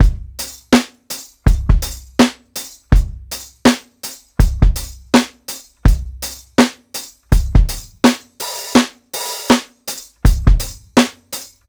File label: 82 SWUNG OFF BEAT, DRUMS LOOPS; BASEMENT DRUMS VOL 2